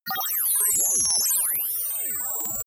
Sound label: interface calculate